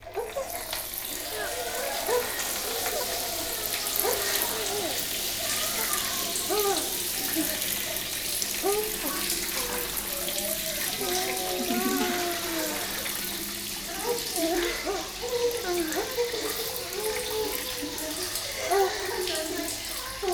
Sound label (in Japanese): お風呂場 同時